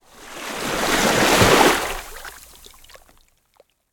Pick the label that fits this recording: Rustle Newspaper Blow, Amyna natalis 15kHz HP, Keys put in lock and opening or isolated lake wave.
isolated lake wave